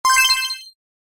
Ui Confirmation Alert

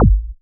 Club Kick

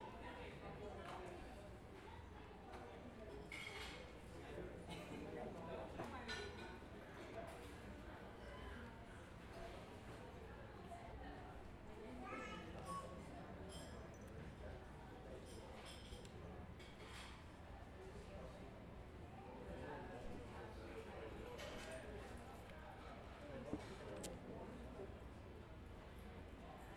Restaurant Coffee Shop Ambience

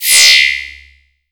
Retro Scifi Laser Sword Unsheathed

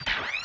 Blaster

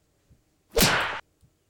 Kung Fu Punch

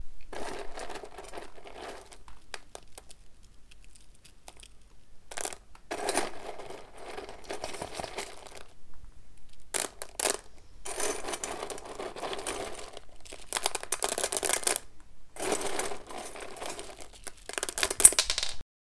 Dog Food

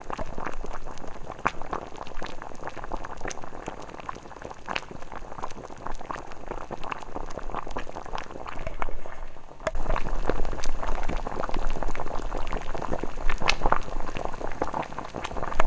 Boilling Water

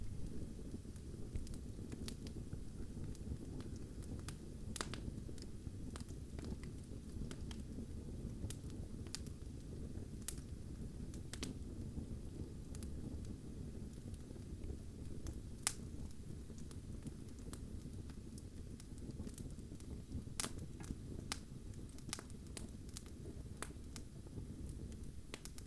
Fire

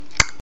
Click

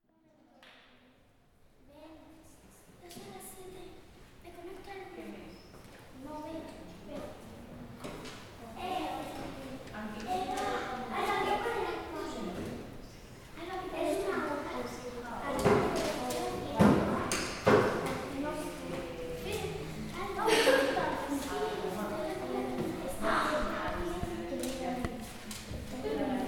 Library Children

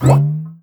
Tube Enter

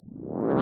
Cartoon Growing

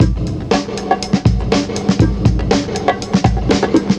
Classic Breakbeats Processed 2 Bar

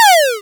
Retro Laser Shot

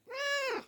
Senior Cat Meow

